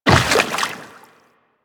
Tenno Step Water, Footsteps, Walk; Warframe

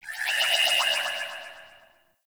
Corpus Ship Tech Chirps; Ambience; Warframe; Corpus Ship Remaster

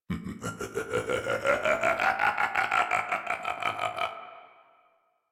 laugh; Warframe; Tyl Regor Boss; Efforts

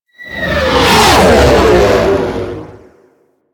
Ship Close Short Fly By, Engines; Warframe; DE; Vehicles; RailJack; RailJackEnemyShips; Grineer; EnginesGeneric

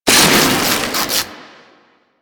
Debris Fabric; Warframe; Ambience; Entrati

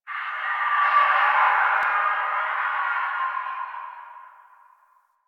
Distant Infested Screams Winter; Warframe; Ambience; Nineteen Ninety Nine

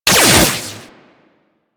Trip Laser Impact; Impacts; Warframe

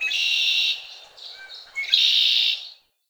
Tree Birch Bird; Trees

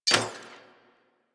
Arch Burst Gun Mech, Weapons; DE; Warframe; Archwing

